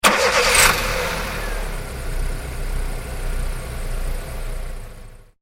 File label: transportation car start idle external rover 214; Car Start And Idle - External Recording - Rover 214; Vehicles; McKinney Sound